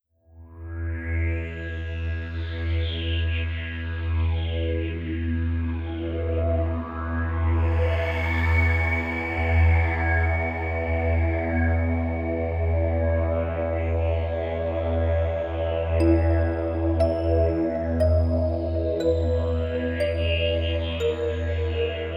HORROR; Angel Ring

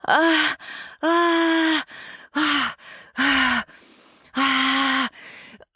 Moan REV; Devour 2021 gamerip pc macos

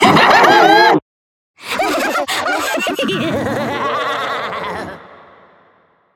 Jump Scare Voice Laugh; Devour

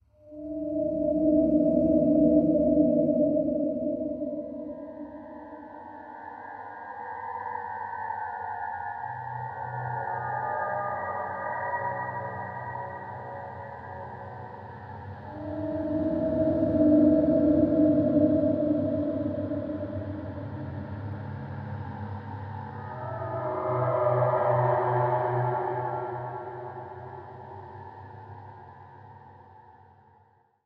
Amb; Amnesia: The Dark Descent

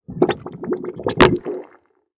Tortures Shit Barrel

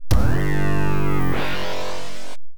Traffic Light Change (Alternate), pinball; Getaway: The High Speed II; Williams; arcade

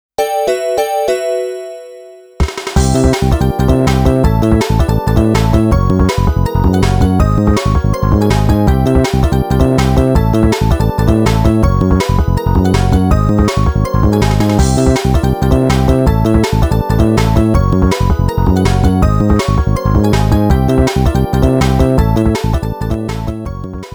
Spurt BGM; Namco Classic Collection Vol.2; arcade soundtrack

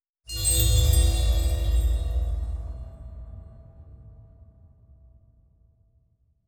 shadow bell

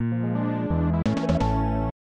Treasure Chest

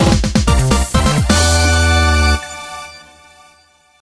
Fanfare; Wii Play Motion

